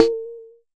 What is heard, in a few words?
Jomox MBrane, drum machines; Jomox MBrane 11